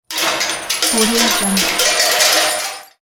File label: metallic drops and echo